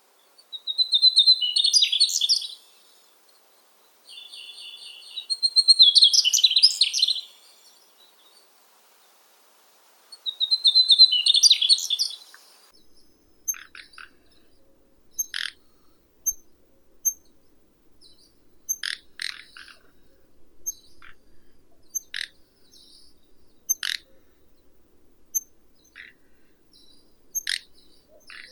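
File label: redstart, Phoenicurus erythronotus; gorihvostka krasnospinnaya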